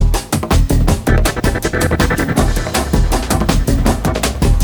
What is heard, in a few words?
hammond